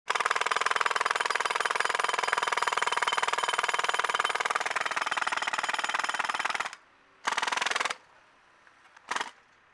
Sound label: Jackhammer, Ambience; Project Gotham Racing 2